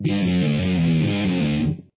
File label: outro